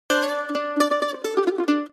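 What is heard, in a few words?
mandolin notification; country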